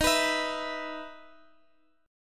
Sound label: E 5th Eb; E5/Eb strummed; JGuitar